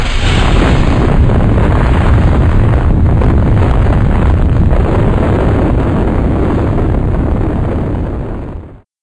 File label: amb; polarcomplex